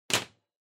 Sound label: Power Strip Noise Cable